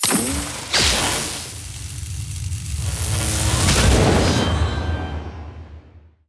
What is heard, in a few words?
jellycake break explode; Candy Crush Friends